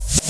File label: backspin, Vinyl; Misc Percussion